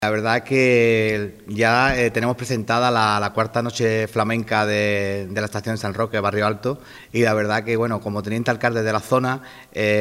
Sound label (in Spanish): flamenco